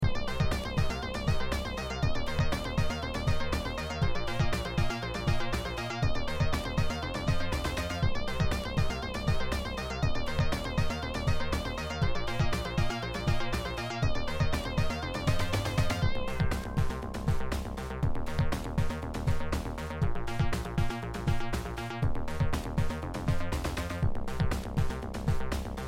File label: bass basstone lead sound beat